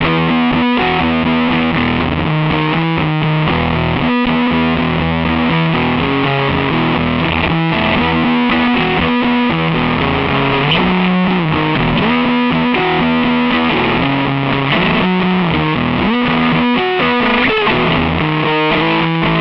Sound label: fuzz only